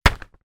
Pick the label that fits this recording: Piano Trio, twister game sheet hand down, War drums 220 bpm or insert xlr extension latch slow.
twister game sheet hand down